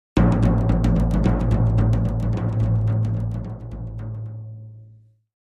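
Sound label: Drums Toms C Low Stereo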